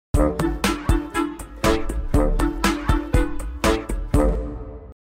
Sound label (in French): Enfant histoire link; Jingles medley